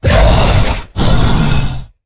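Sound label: Stealth Attack; Evolve; Goliath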